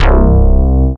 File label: Synther C, Basses; Total Drum&Bass